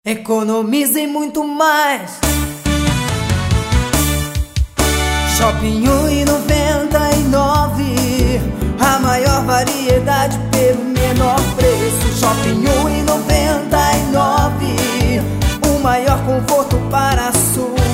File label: shopping jingle